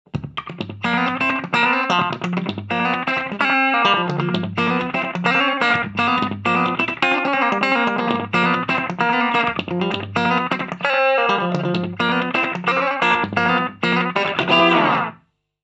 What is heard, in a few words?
Crush Clean Slap Back Echo In FX Loop